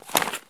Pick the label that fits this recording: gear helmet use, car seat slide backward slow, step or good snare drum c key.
gear helmet use